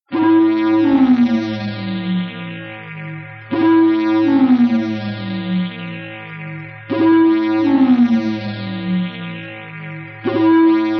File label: Jingle